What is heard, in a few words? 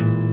dog